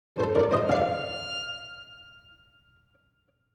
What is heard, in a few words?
Video game reward chest opening pizzicato